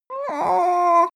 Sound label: cartoon monster tiny sigh